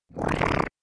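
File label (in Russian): слизистая пушка из научной фантастики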